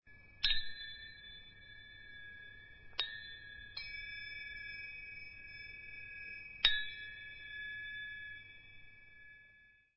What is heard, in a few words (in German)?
zimbeln